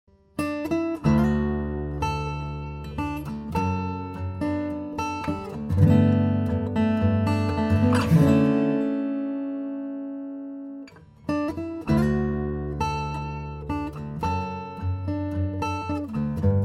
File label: guitar riff